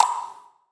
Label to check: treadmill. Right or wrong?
Wrong